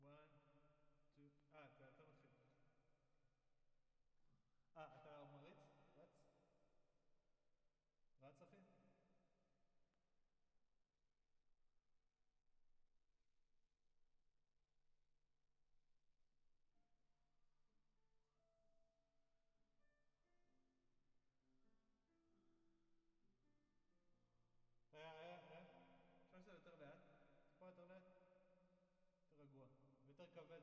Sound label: Drums Verb